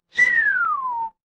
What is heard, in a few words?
cartoon slide whistle